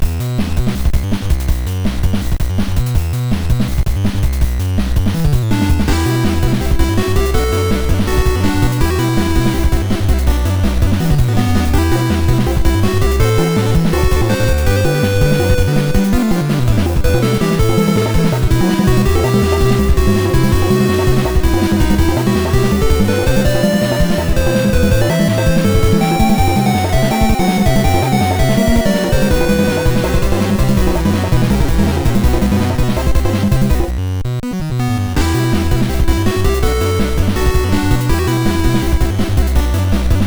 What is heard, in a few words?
Jungle Beat; 1001 Spikes